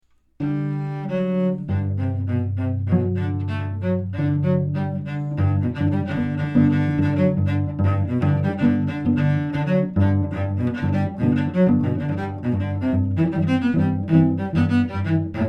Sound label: Etude for 2 Cellos